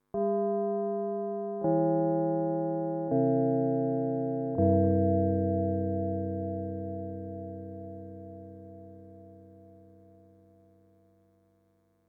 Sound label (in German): vier fachgong